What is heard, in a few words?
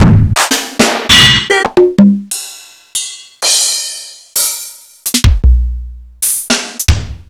Yamaha SY35